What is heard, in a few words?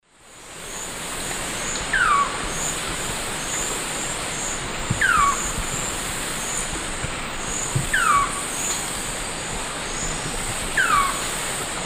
Piaya minuta, song; S. America; Eastern Lowlands